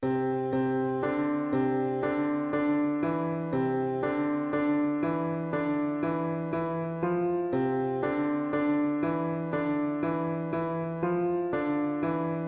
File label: piano melody